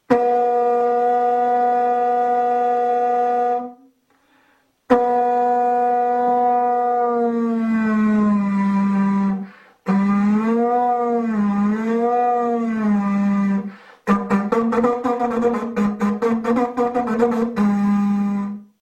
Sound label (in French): conque; instruments